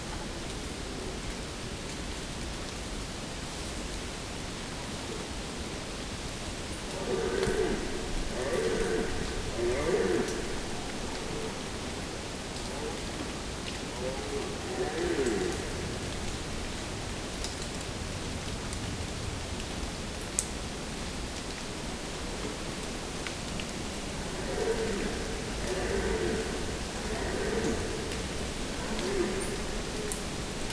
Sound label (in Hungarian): potyogo agak lengedezo lombkorona mely volgyben bogo bikak, gimszarvas termeszetben